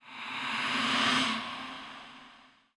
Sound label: cheer